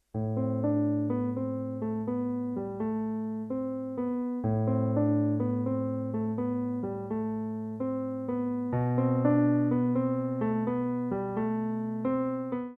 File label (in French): improvisation, piano; improvisation 6